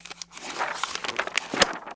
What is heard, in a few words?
Book Pages Turning